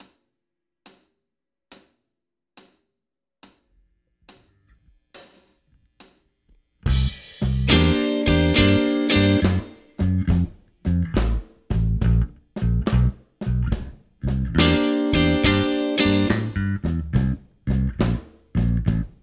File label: slow, 9th chord substitutions, blues guitar